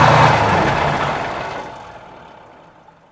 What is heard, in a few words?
apc shutdown; vehicles